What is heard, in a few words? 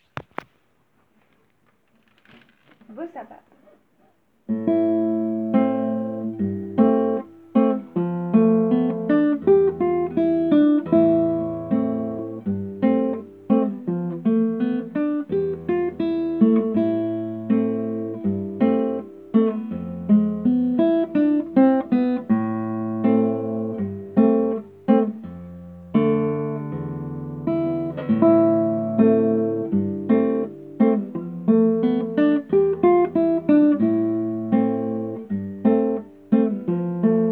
Bossa